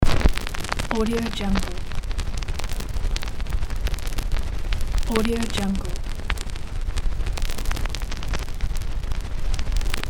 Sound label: vinyl record crackling noise